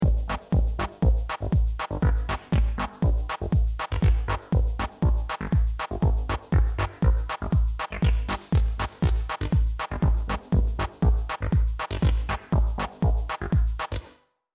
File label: TD-3 Audio Pattern